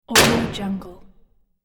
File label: industrial oven door slam